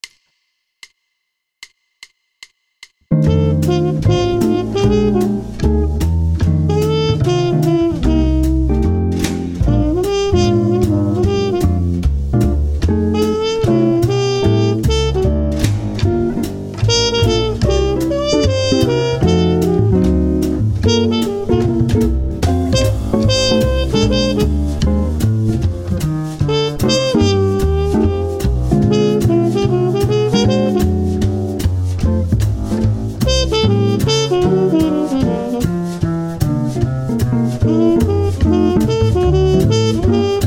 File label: etude blues in F 150 bpm